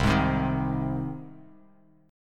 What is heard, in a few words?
C Minor Sharp 5th C down Bass Standard; strummed; JGuitar